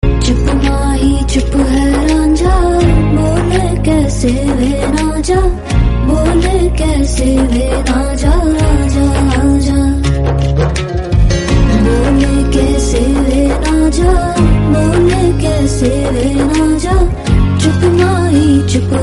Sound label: female version theme bollywood romantic; shershah